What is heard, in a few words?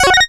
confirm